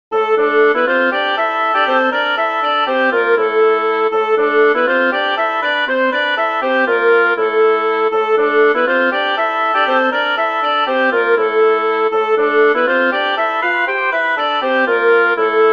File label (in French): Bourrée à 2 temps; de la basse cour